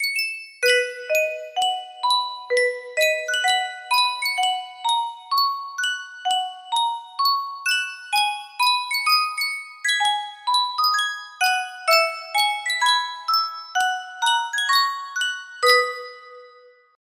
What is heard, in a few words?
Yunsheng custom tune music box